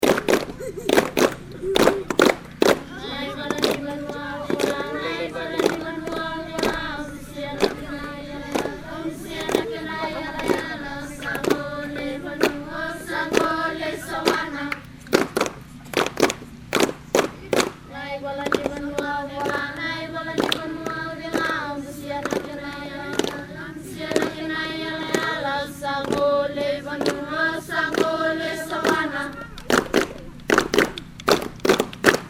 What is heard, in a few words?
Clapping Music, fiji